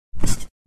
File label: Bed jumping